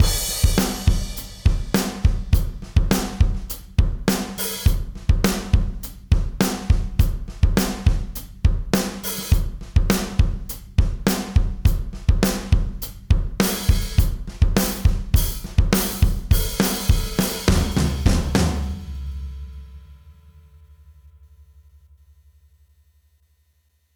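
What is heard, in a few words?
tegeler audio manufaktur vtrc drums gain eq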